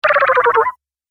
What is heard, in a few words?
Arcade Game Medium Slow Down Beeps, retro; Video Game Sound Effects